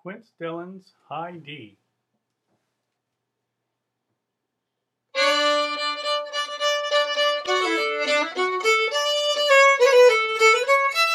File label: fiddle; festivaltunes